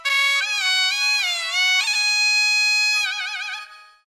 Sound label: Suona